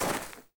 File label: grass land; Golden Crayon Sounds